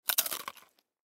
Human Bite Food; Bite Food Pretzel Crunch; Eating; Sound Effects:Human

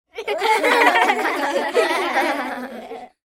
Kids Giggle Laugh; Human; Talking (Sound Effects:Voice)

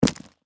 Industry Extension Cord Set Down; Factories (Sound Effects:Backgrounds)

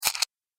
Sci Fi Factory; Production Element 4

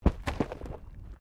Body movement Impact Roll

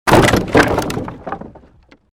created wooden barrier smash medium

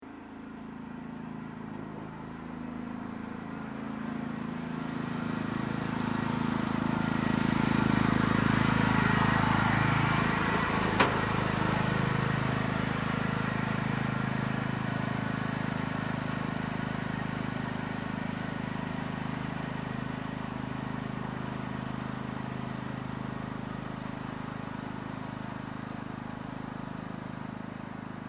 Tractor Small Motorized Cart Passing; Construction, Transport, Vehicles, Industrial; Artificial

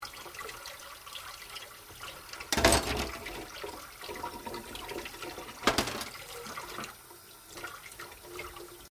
Washing Dishes Running Water; Household Appliances; Appliances (Sound Effects:Household)

